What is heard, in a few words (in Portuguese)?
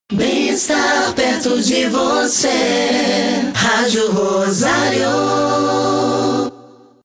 VH ACAPELLA BEM ESTAR; shopping; ROSARIO